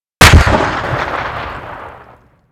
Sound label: Inf Weapons; Red Orchestra 41-45